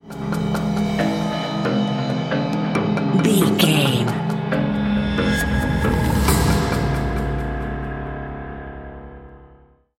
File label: wilderness tension stinger